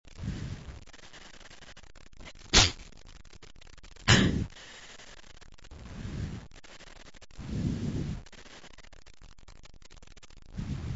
attempted stifling, male

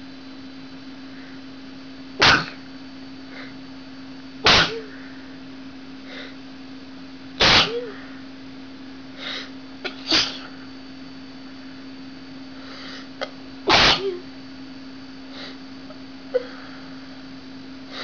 dusting sneezes, female

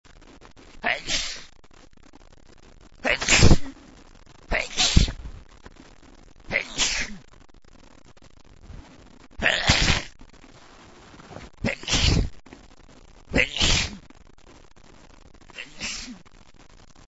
late march sneeziness, male

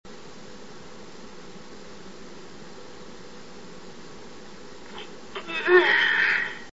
suppressed sneeze, female

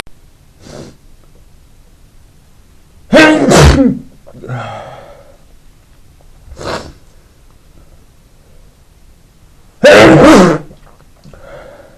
stifle, male

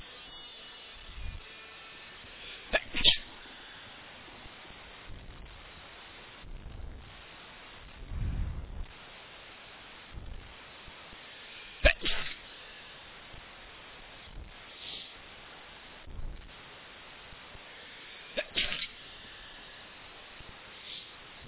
sneezy, male

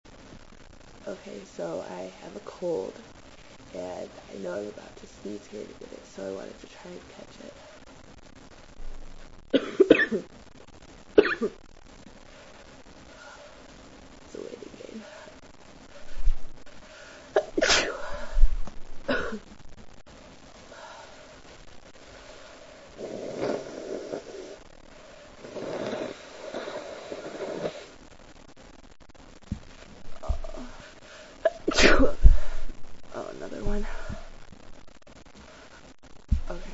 sick, female

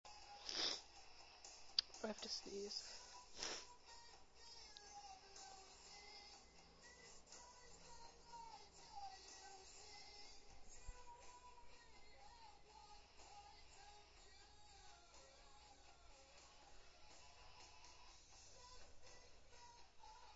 have to sneeze, female

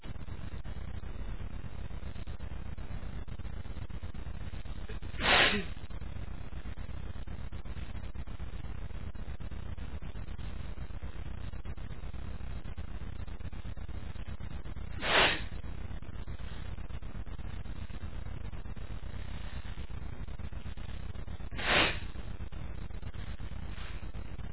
hayfever, male, achoo

